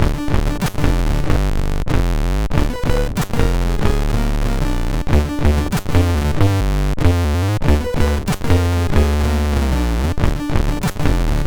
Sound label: Breakstep, MOS 6581; MOS6581R3